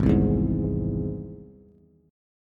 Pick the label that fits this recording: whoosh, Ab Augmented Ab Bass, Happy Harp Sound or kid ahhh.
Ab Augmented Ab Bass